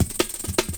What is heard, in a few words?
up tempo, break beats